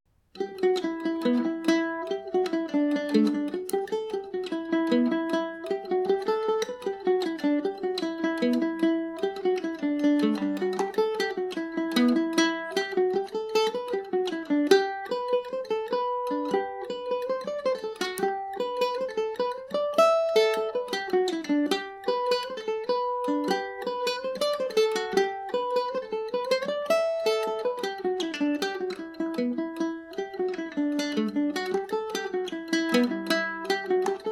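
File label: E minor, Irish mandolin; night before larry was stretched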